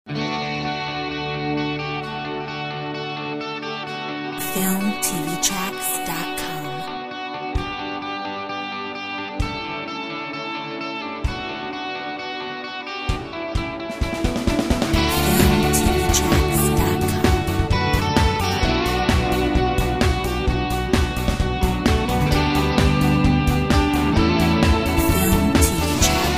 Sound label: Uplifting force, Uplifting Inspiring Royalty Free Music